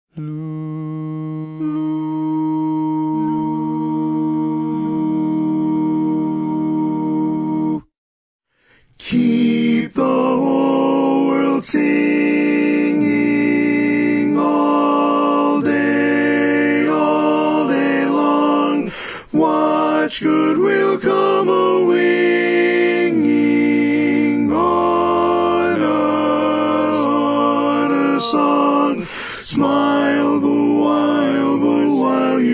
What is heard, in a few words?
Tenor, Barbershop Harmony